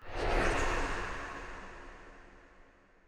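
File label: spin button release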